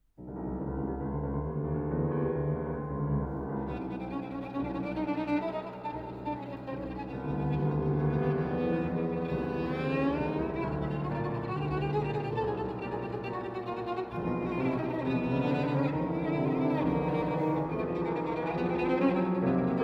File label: Violin and Piano Molto allegro; Sonata No. 3; Op. 124